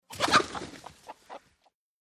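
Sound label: Hen Startled Flap Wing, chickens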